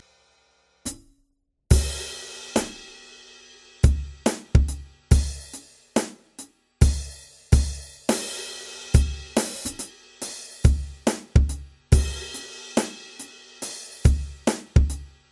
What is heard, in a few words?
drum